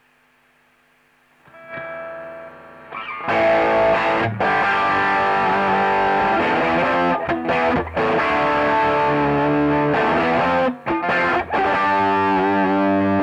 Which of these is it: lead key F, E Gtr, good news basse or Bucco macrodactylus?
E Gtr